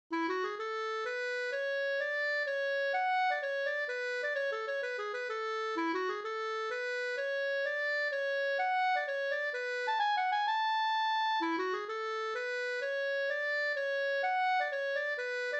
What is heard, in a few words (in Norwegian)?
pols; Leken hinnes Kokk-Sofia no